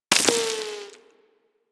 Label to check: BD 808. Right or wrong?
Wrong